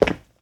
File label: Jump Tile Land